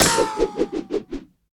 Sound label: drive By Impact Spin; netent; narcos